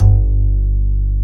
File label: Acoustic Bass